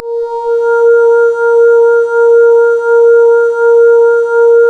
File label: A#, Synclav FM; Big Fish Audio; SPACE1